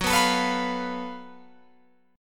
F sharp Minor Major 7th Double Flat 5th, Guitar, Open F; strummed; 18,18,21,18,20,18